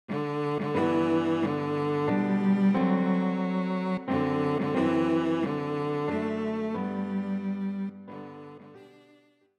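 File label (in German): Happy Birthday Violoncello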